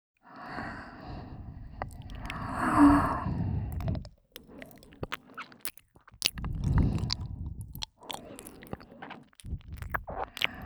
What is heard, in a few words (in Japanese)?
耳舐め・左; アリス; 把萝莉魔法少女催眠成最喜欢谄媚奉仕脏鸡鸡的女孩的催眠APP♪